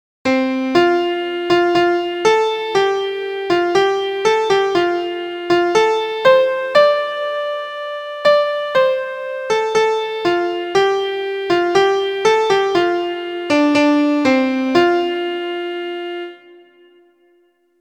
pentatonic

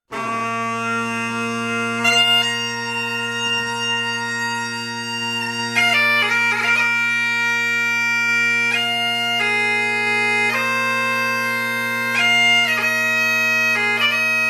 pibroch

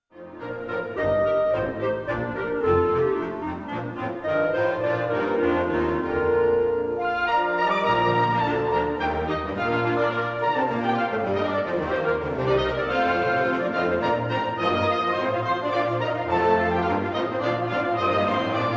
woodwind

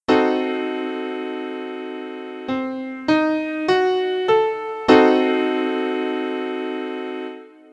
dim 7

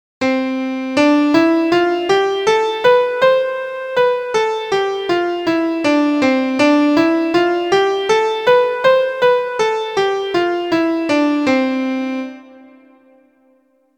scale major

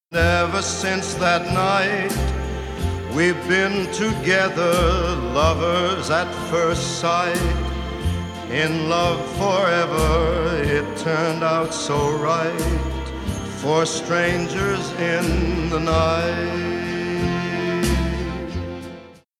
step

